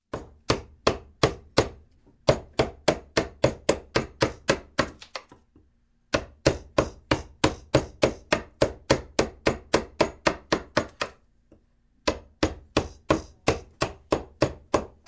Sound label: Construction, Chisel Chiseling With Chisel And; Chiseling With Chisel And Hammer; Sound Ideas 2000 Series